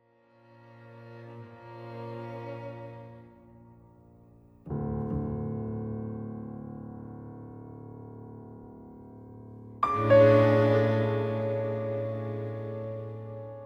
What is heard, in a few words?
Surprise; The Long Dark